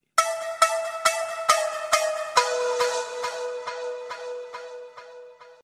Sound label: Cute Flute